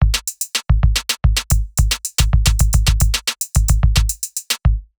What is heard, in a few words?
Beat